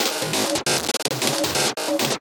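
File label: drum beat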